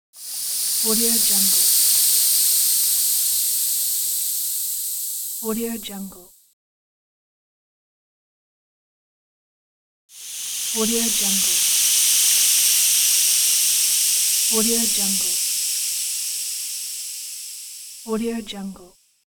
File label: air release fizz